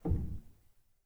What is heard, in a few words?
rel, Grand Piano; bitKlavier Grand; Piano Bar